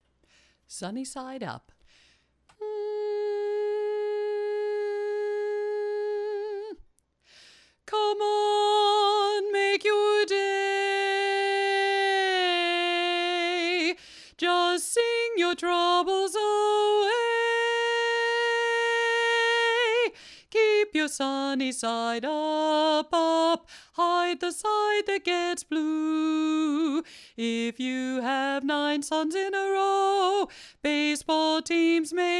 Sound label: lead alone